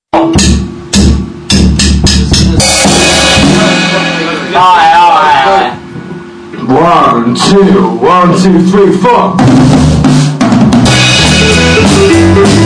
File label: jazz; Jazz Intro